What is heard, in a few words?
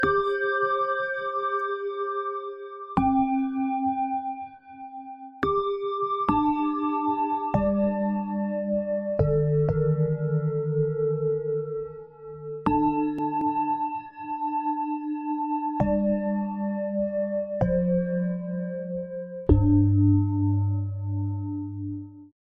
tibetan singing bowl; restin